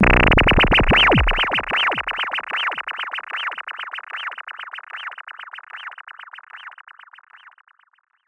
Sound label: SH-09 Delay